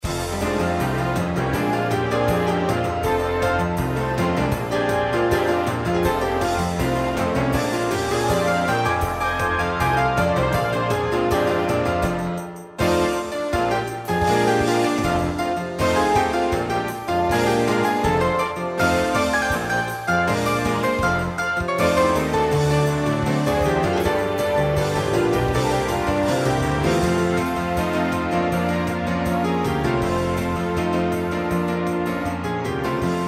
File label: Rock Sonata